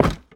盔甲架破坏声

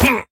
卫道士受伤声

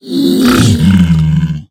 猪灵转化声

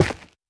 玄武岩破坏声

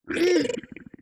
炽足兽开心声

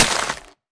沾泥的红树根破坏声

